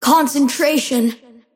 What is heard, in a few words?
kid pain; Invoker